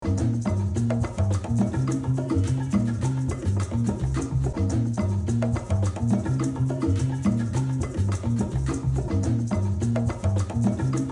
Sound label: cuban bass long